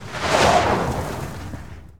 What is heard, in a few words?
Auto Tires Skid Rock; Automobiles